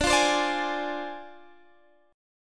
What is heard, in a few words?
D Minor 7th Flat 5th